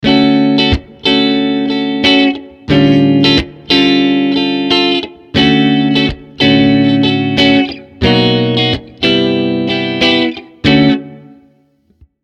Ibanez ARX 300 Neck Bridge

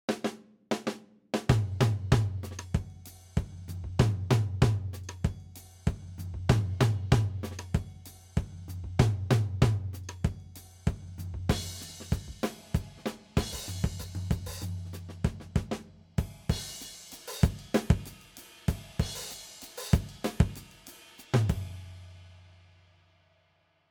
70s Tight Full Vintage

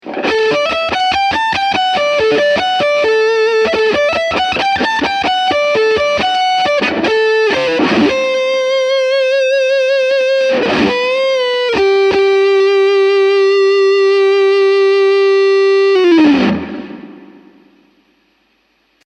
OD2 Reverb

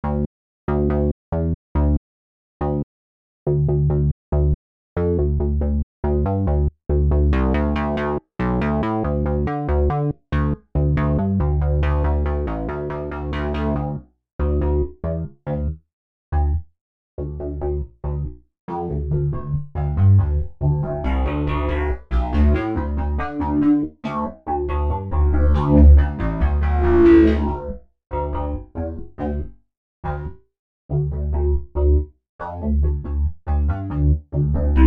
Bass; Kamm

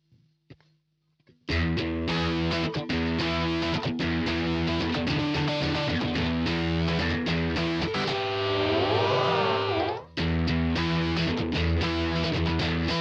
Swirl A guit

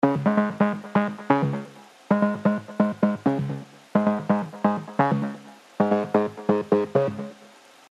KORG MS 20 V Tape MELLO FI Grainy Hi Pass; Arturia

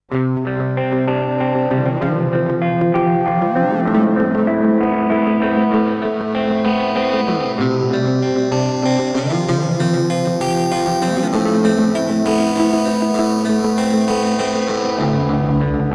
Headrush Looperboard Loop LoFi Degrade

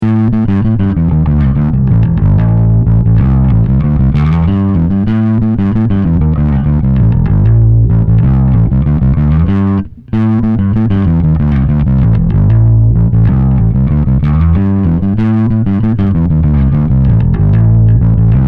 Bass; Two Notes Le Bass

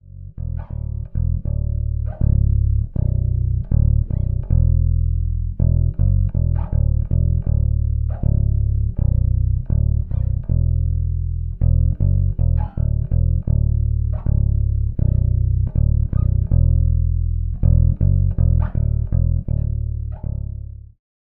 Sadowksy NYC Jazz Low B passiv

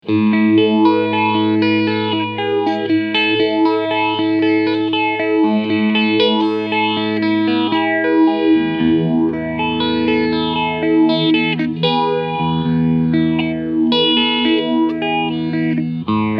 JHS Pedals 3 Series Phaser Feedback ON

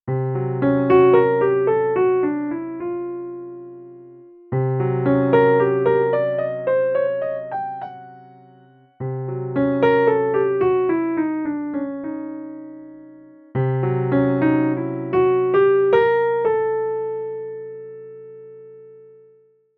Kurzweil PC4 9ft Grand

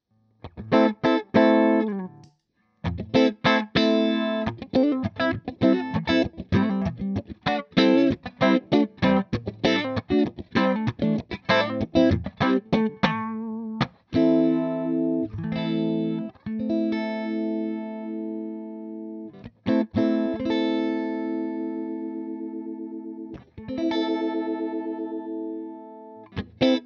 Halo Phaser

